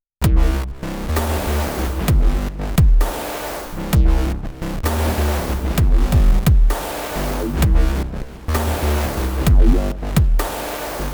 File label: yamaha montage 8 performance; os 2.0